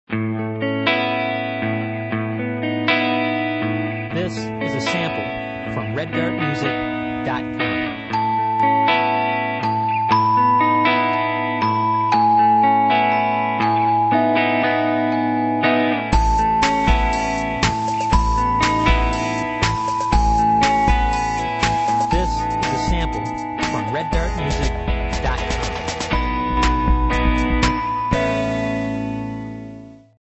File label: Strum 120; Solo guitar then groove